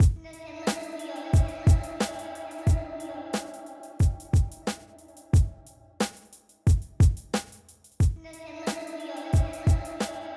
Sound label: DRUM